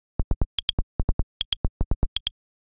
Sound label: diode; Nothing Phone 3; ringtones